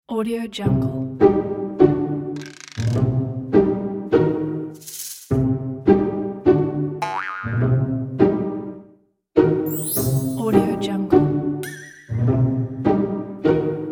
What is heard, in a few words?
sneaky cinematic comedy